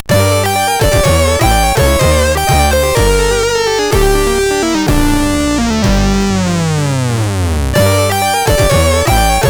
Fade In Intro, CSG8580R5

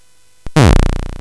Space Gun

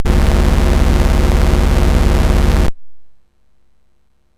Dragonworld sid MOS6581R4

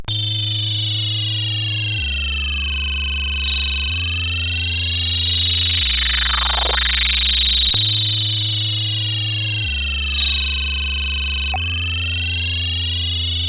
Jingles, sid CSG8580R5

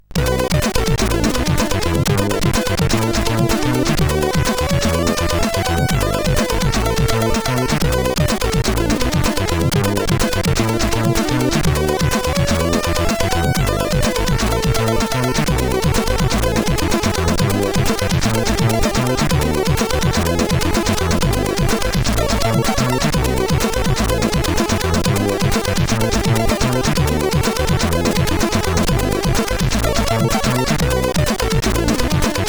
Double Impact note, sid CSG8580R5

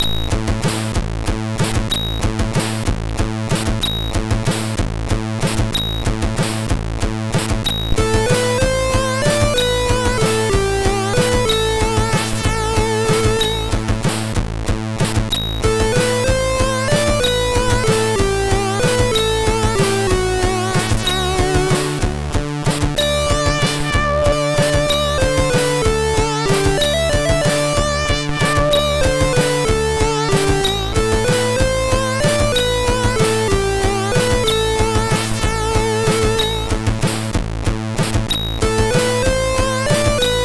Waltz sid MOS6581R3

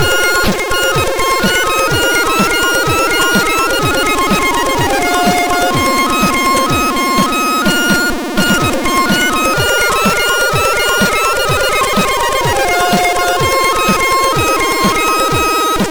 menu, sid MOS6581R4; Music Collection 4

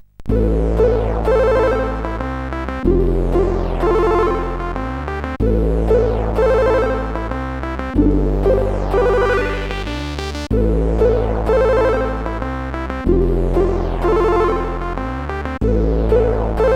Kirmestechno, sid CSG8580R5

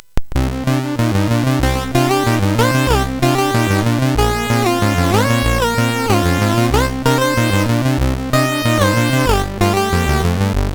Intro, MOS6581R4; No System; Jipe

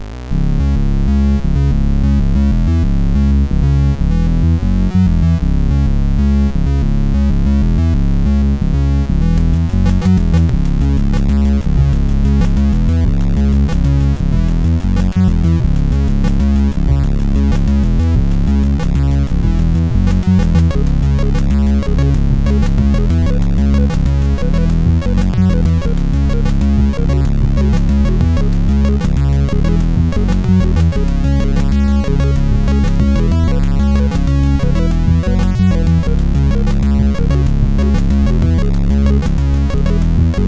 half funk, sid CSG8580R5

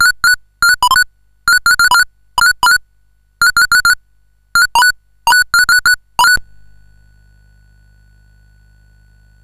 Dragonworld sid CSG8580R5

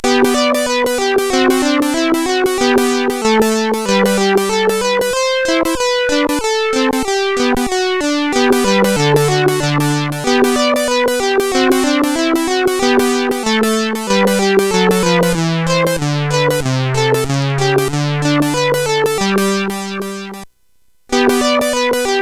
Instrumental Compositions, CSG8580R5

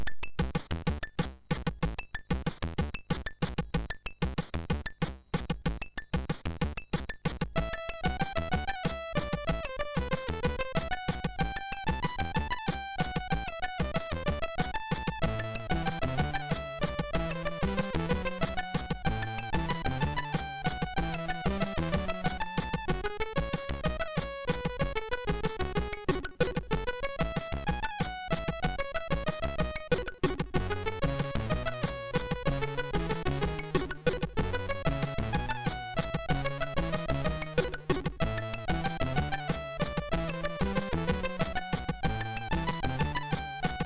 Age of Serpents, sid MOS6581R3

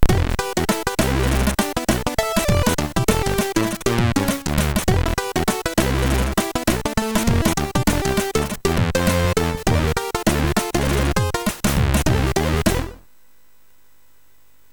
Trakten, MOS6581R3

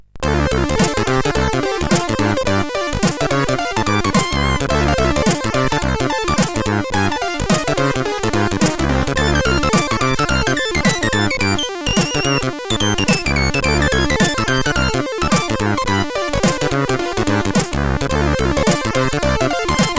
Slapped Bass, CSG8580R5

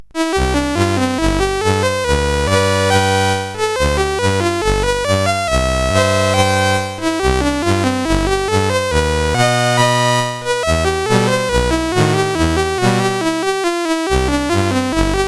Polka; sid CSG8580R5

